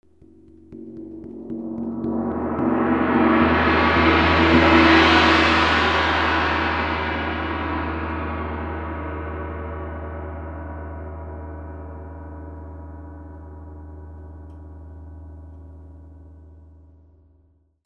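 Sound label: percussion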